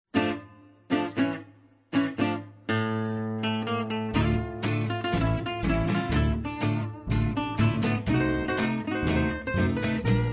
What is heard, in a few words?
Tango